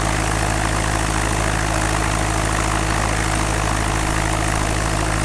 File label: c172; fsx